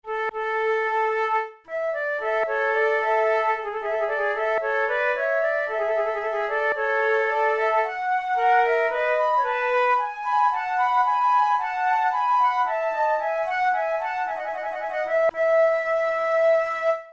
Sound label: allemande, flute